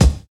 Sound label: bright bass drum sample c sharp key; kick